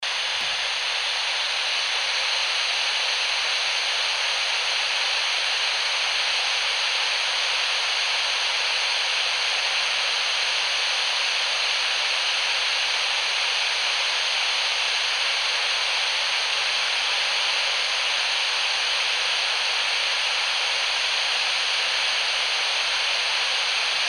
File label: CMBR noise